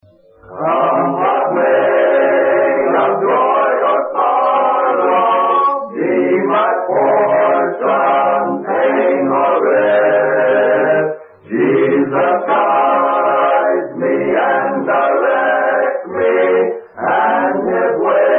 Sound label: a capella hymn; jesus knows